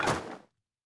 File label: Banner Out